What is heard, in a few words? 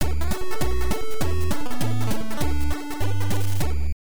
Level Clear; The Jungle Book; nes; Virgin Logo